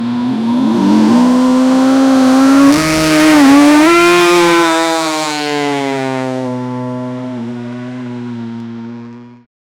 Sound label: formula one car revving i, race car